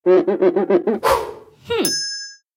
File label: Archer cleaning arrowhead; Emote